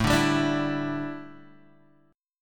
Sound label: D Suspended 2nd Suspended 4th A